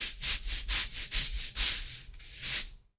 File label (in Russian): schtka skrebt po tsementu